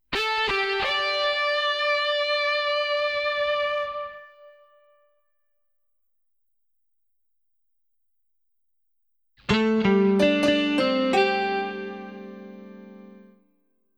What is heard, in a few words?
fills, jingles